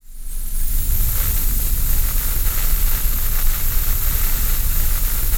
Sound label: GARBAGE BED, VOCAL WIND; ILIO; Vocal Planet; Jazz & FX